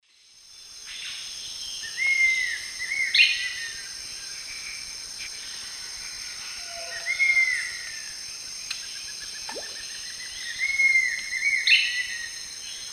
Attila cinnamomeus, call; S. America; Eastern Lowlands

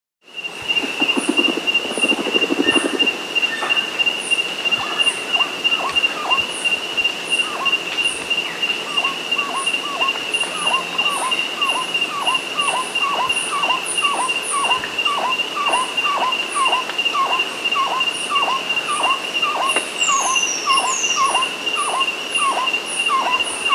Andean Guan (Penelope Montagnii), Andean Birds; S. America

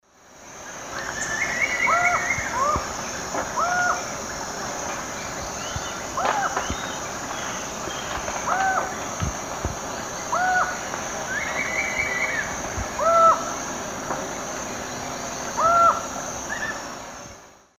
Herpetotheres cachinnans, calls; S. America; Eastern Lowlands

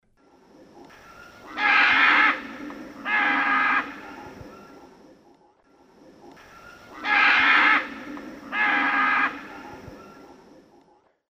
Ara chloropterus, flight calls pair; S. America; Eastern Lowlands

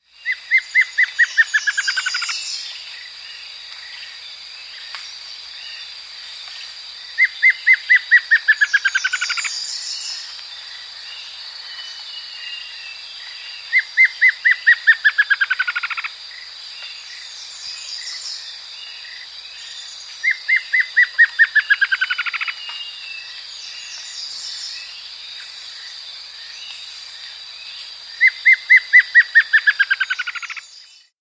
Plain Antvireo; S. America

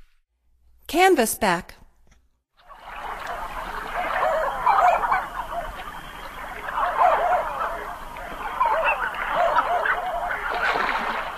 Canvasback, Songs of Birds of North America; Stokes

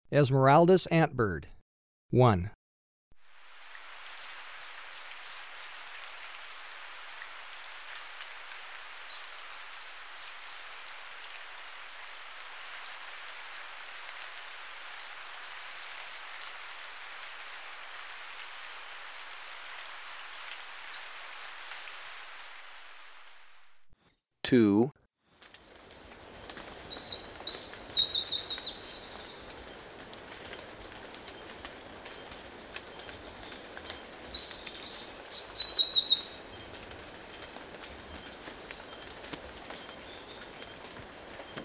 Esmeraldas Antbird, Birds of Ecuador; S. America

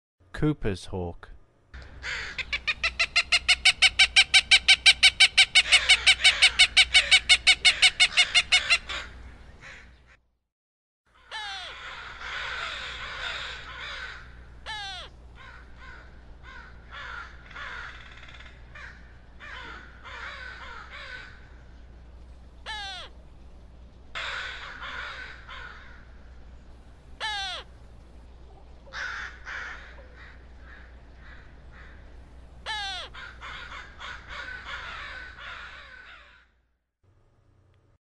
Coopers Hawk, Songs of Birds of North America; Western USA; Western USA Songbirds

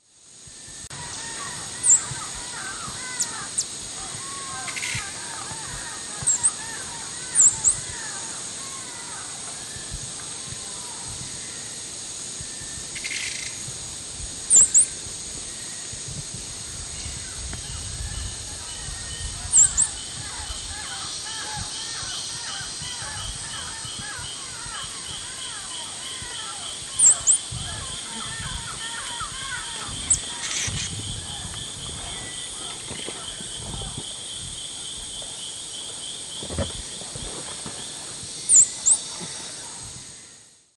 Parkerthraustes humeralis, calls; S. America; Eastern Lowlands

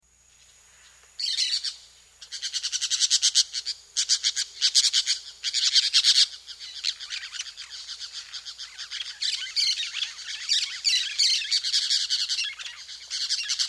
Orange-Chinned Parakeet, S. America

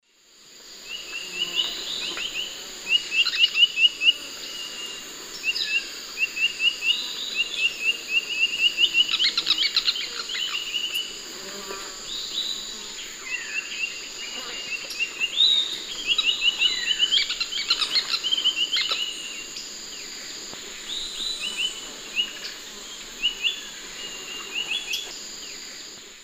Legatus leucophaius, slow alternate song; S. America; Eastern Lowlands

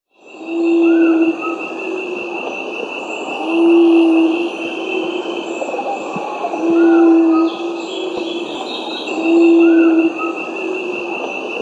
Ruddy Quail-Dove (Geotrygon montana); S. America

